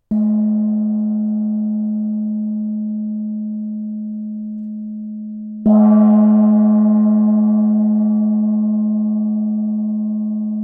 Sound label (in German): tamtam